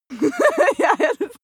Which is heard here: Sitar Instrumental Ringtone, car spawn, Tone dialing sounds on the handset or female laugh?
female laugh